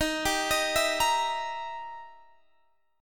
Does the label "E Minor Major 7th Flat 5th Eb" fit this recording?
Yes